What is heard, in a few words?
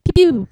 pew pew